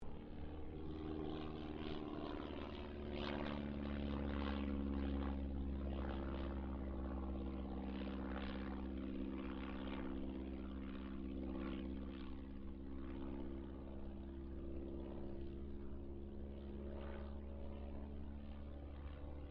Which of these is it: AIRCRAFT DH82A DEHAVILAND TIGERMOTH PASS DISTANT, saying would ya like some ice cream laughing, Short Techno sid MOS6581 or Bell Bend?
AIRCRAFT DH82A DEHAVILAND TIGERMOTH PASS DISTANT